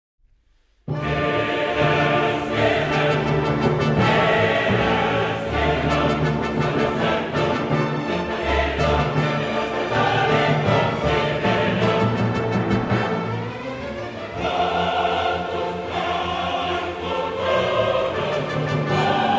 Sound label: Requiem in D Minor, Dies Irae; III. Seq. No. 1